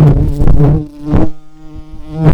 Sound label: Lapidarius queens, bumblebees